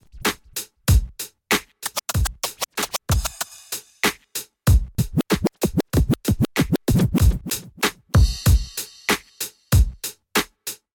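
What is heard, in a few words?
vinyl Break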